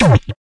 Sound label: fx drum